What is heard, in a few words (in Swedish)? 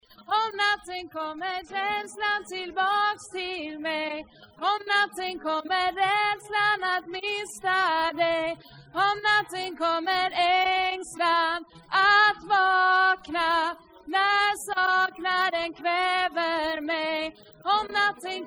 om natten alt, stammor